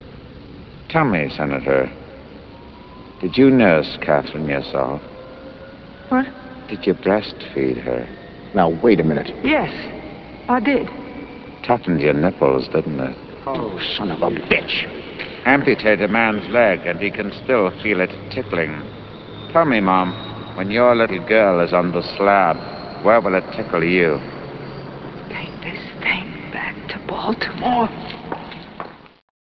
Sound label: silence